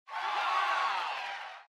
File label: dice; LU9000 Arena; Dice Game sounds; LG